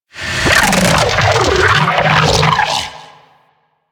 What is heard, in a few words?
Vocal Attack; Warframe; Sentients; Dolicholyst